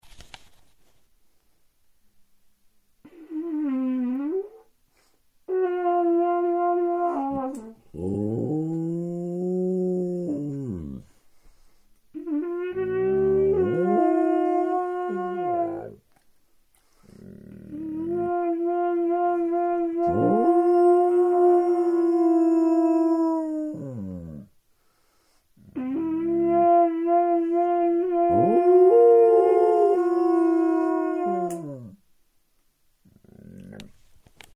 Toucan The Singing Bird

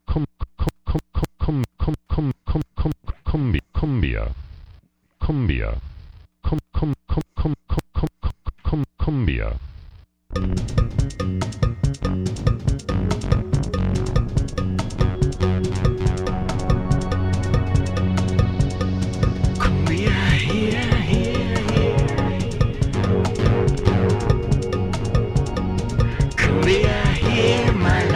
Cumbia Soundcheck

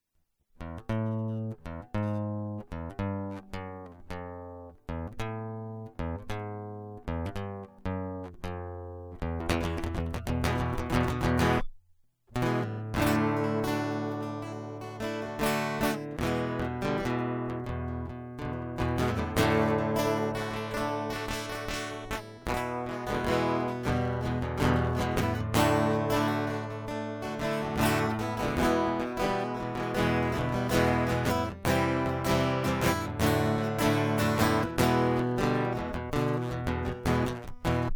Guitar Loop

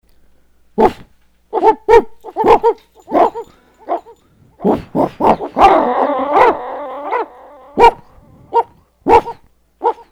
Barking

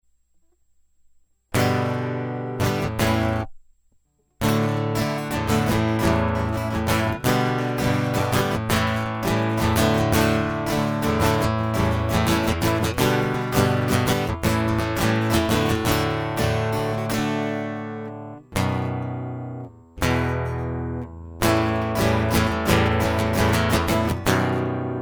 Guitar Prequel

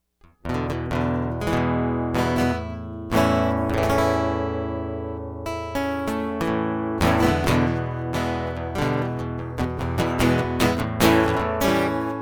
Guitar Loop Prelude

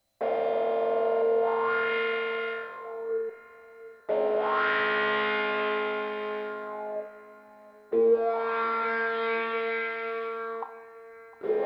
Guitar; Rawhide Underwear

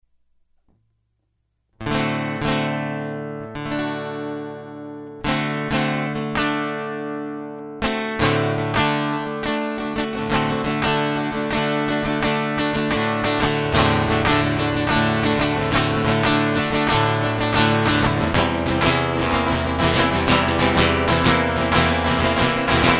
Guitar Loop

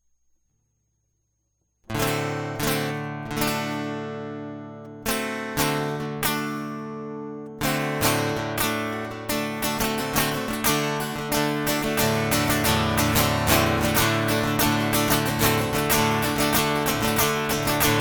Guitar Interlude

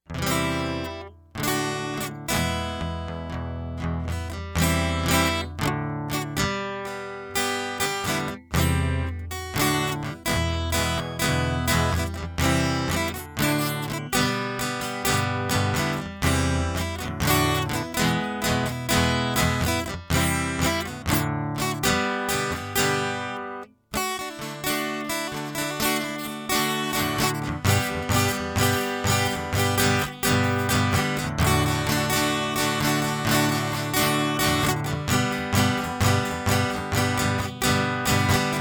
Guitar Loop; 3rd Mass

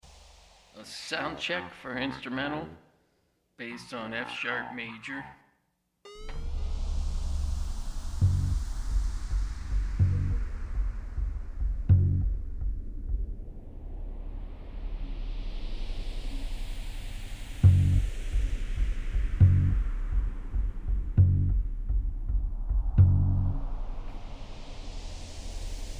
Street Soundcheck

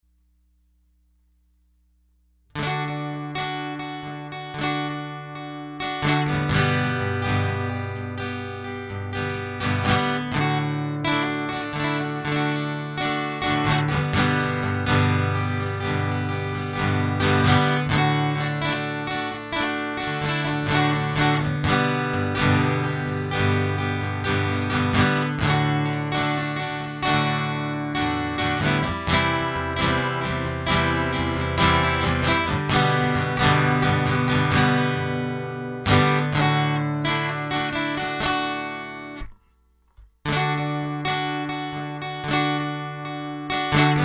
Country Music Guitar Loop

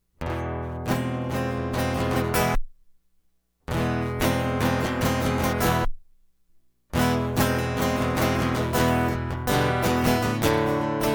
Guitar Prequel

